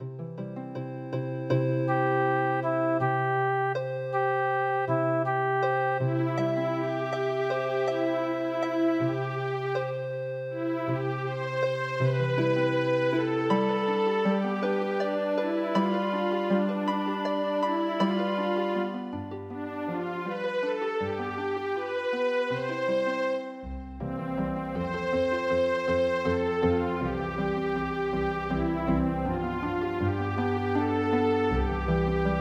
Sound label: Harp